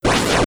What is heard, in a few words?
Fly End; Celerity